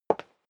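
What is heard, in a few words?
step high heels wood parquet single right, footsteps; schritte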